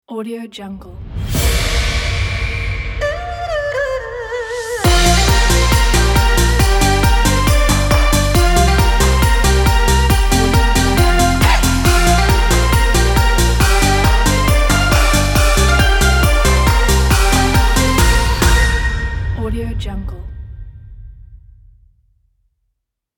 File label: chinese new year party logo; wavebeatsmusic